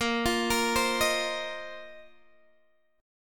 Bb Suspended 2nd Suspended 4th Bb down Guitar Open G; Bbsus2sus4 arpeggiated